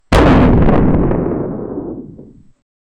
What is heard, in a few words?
a comical and mushy full, gore